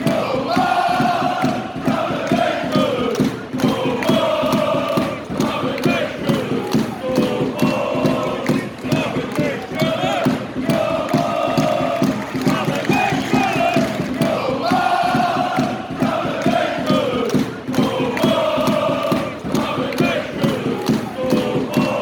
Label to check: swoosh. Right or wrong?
Wrong